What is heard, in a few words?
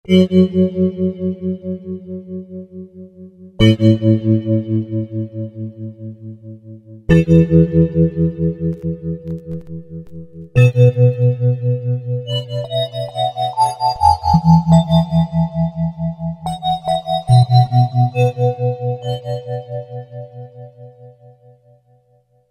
Winter night, Roland V-Synth